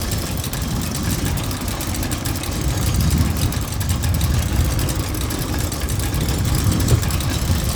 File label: Secret Floor Panel Loop; Warframe; Ambience; Entrati; Dead End Secret